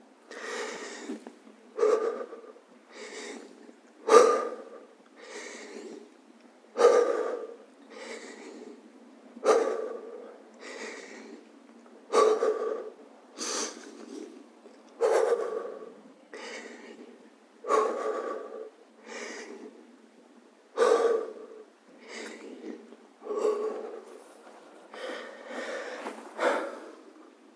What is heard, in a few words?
Pushups Breathing Exercice